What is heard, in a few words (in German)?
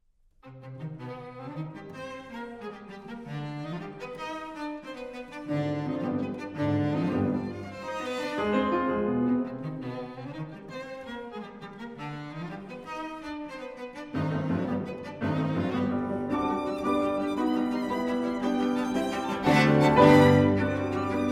Klaviertrio c-moll, Vivace; Dvorak